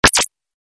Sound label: harsh faash, message tones